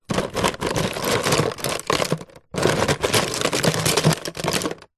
office tub pens pencils rummage

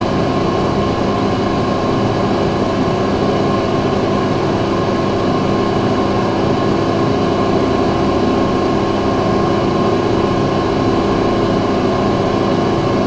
household toilet extractor fan

